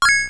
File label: exp max; P3D-Legacy